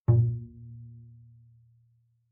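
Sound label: Bass pizzicato fortissimo sul A Bb2, Double Bass; MIS Pitches - 2014; Strings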